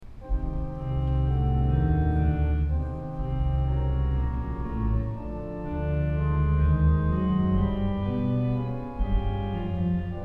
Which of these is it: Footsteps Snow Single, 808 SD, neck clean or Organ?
Organ